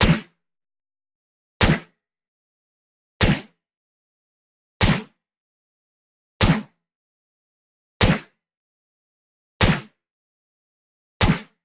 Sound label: Plasma Rifle Gun Shots Various